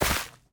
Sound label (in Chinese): 缠根泥土踩踏声